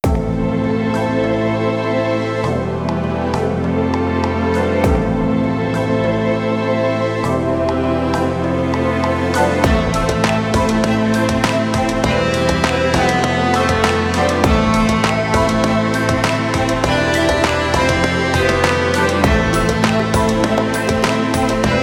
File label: cinematic ambient intro